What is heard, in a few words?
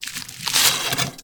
arrow grab from quiver, Medieval Weapons Sound Effects